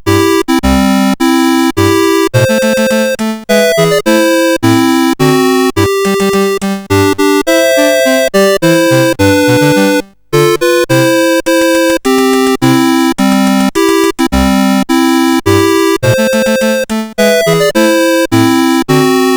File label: Fireworks Celebration, CSG8580R5; Complete Computer Fireworks Celebration Kit